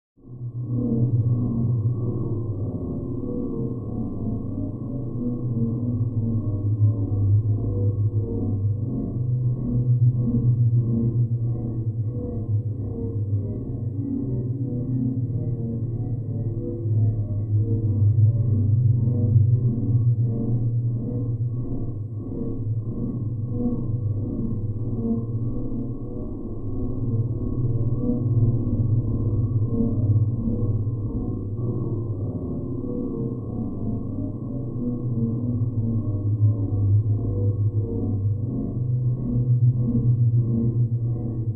Terrifying pulsating low frequency rumble; radio signals

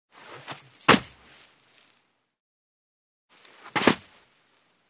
Jump in the sand; steps

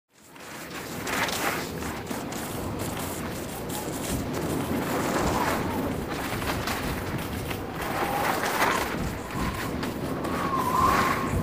Skiing in the snow

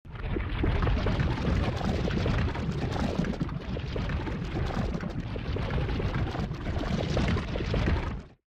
Water bubbling

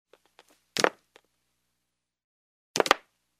Jump on the wooden floor in heels; steps

